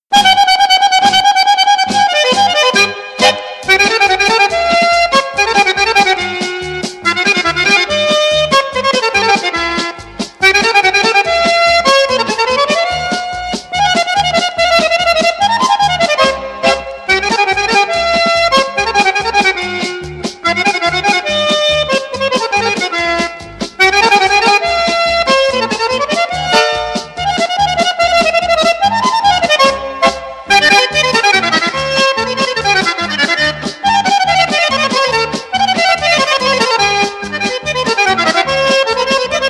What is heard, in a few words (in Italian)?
POLKA